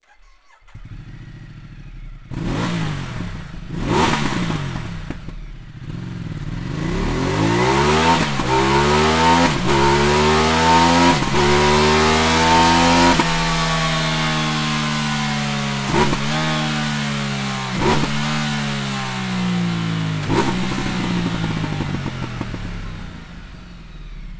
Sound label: akrapovic slip on exhaust ducati multistrada 1200 s; Hexagonal Exhaust Sound